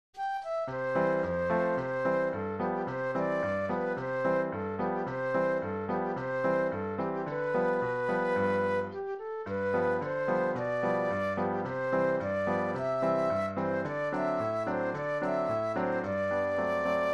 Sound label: flute solo; patriotic